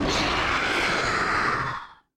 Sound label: jumpscare sound; insomnia